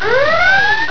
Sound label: alarm; qate unidentified